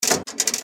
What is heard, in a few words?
cart out